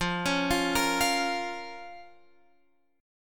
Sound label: F Suspended 4th Sharp 5th F down Guitar Open F; Fsus4#5; arpeggiated